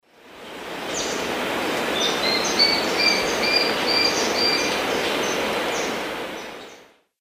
Sittasomus griseicapillus, song; S. America; Eastern Lowlands

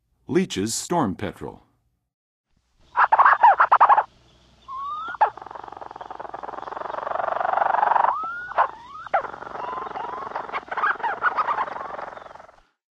Leachs Storm-Petrel; North America; Western USA; Western USA Songbirds

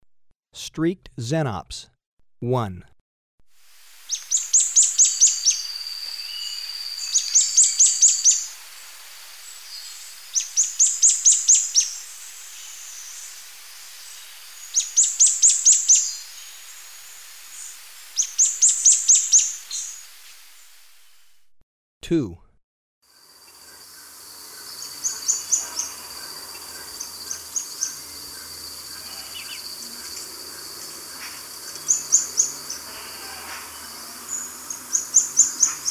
Streaked Xenops; S. America

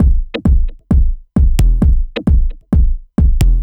Kick Loop, Dance Beats

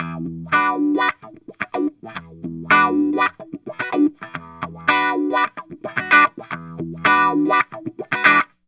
Guitar E Funk

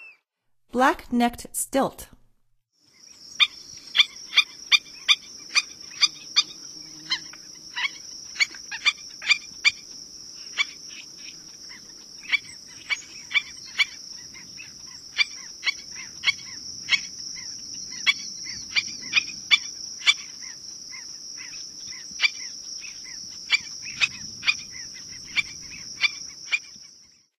Black-necked Stilt; North America; Stokes

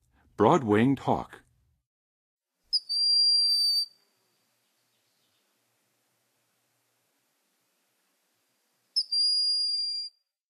Broad-winged Hawk, Birds of North America; Western USA; Western USA Songbirds

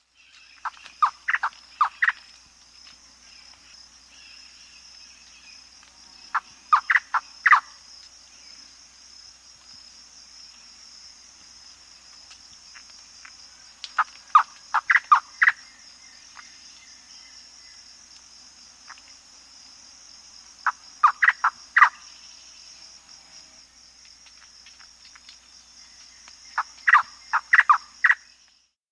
Manu Antbird; S. America

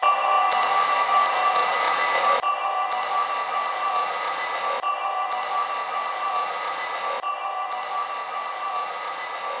Bells Fade; Electronica; Metal

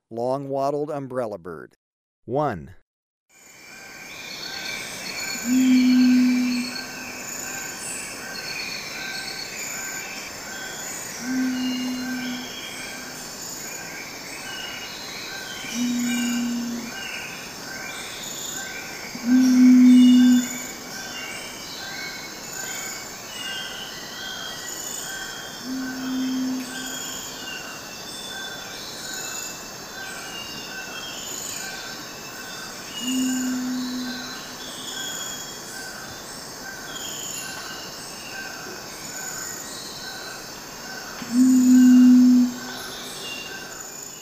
Long-wattled Umbrellabird, Birds of Ecuador; S. America

